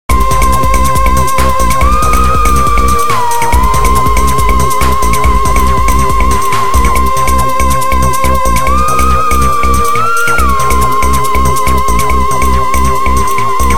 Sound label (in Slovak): Vibracie dokola, Hudba; Revelation Sight and Sound SK